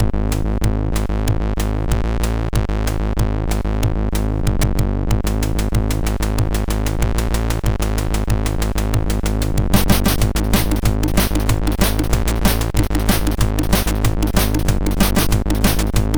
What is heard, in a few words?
Hard Tekno, MOS6581R2